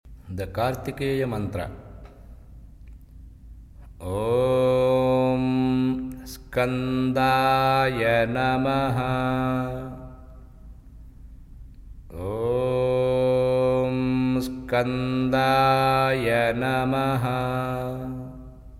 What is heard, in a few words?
Karthikeya Mantra